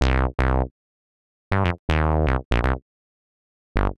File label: buggy bass C; PowerFX - Nu Jazz House